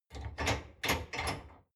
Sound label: dishwasher frame fold up